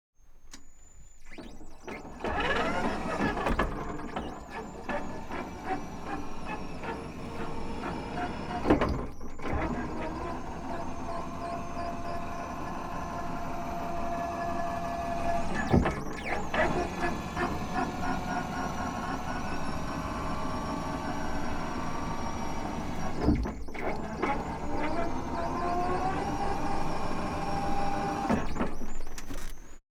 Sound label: Turret Movements Short Troop Area POV, Warrior IFV; INT; Mono; Custom Vehicle Recording; Hammer & Spark Audio Production